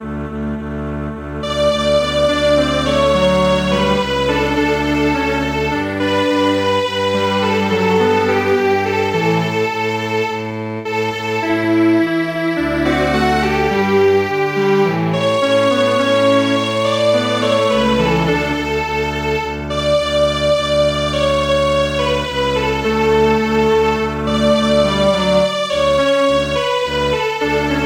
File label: Strings Quartet, traditional; Stack the ants